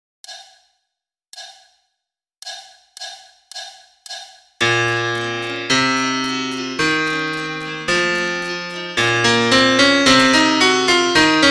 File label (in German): Klavier Solo, trompete; Fantasie in c - Moll; (Duett)